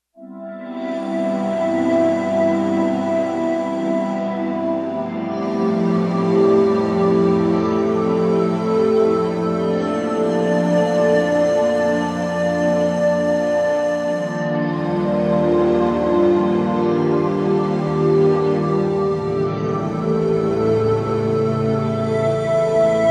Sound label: Slw Glkn FLute; Digital Synthesizer